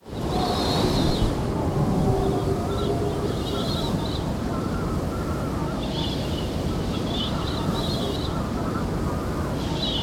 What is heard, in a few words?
wind